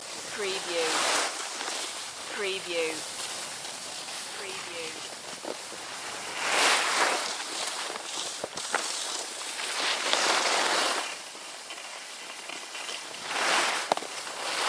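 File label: Ski Slope Ambience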